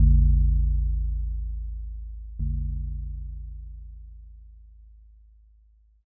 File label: temple bell